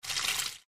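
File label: zvuk zharki, frying sound; The Witcher Hunt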